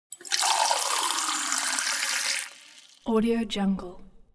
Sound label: elements water fill cup; smartsoundfx